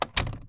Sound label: door shut